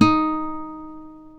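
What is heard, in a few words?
D#3 FLAMENCO, FLAMENCO guitar; WorldSounds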